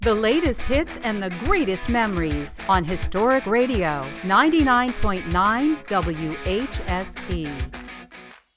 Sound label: upbeat